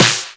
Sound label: Claps; Vengeance Samples